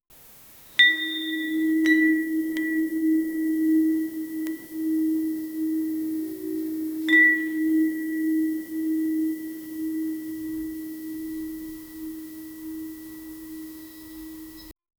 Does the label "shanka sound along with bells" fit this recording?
Yes